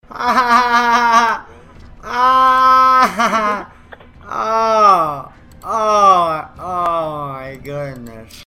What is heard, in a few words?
laugh